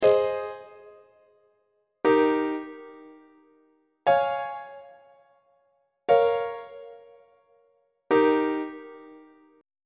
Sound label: g sharp major half cadence on treble clef